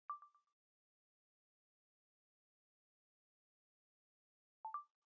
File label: iPhone Start Stop Video Recording